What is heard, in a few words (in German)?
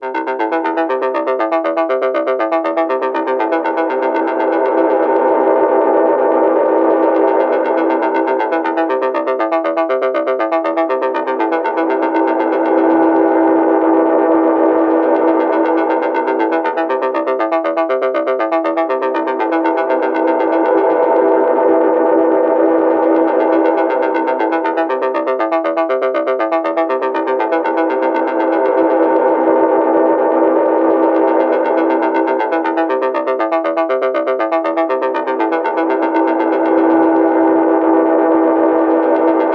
Moogerfooger Fx Ebbe und Flut; Amazona Moogerfooger Workshop